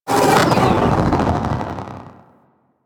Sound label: Space Fighter Coil Agent Clone Destroy; Warframe; Enemy Ships; Space Fighter Sentient Coil Agent